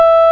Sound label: Tone Beep High